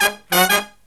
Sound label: Brass Riffs